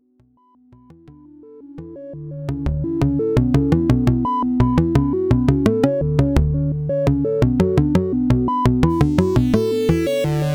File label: modular